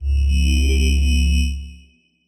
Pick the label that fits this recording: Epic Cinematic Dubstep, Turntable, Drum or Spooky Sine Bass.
Spooky Sine Bass